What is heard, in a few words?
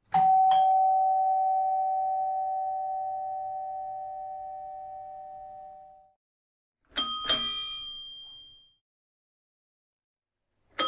Doorbell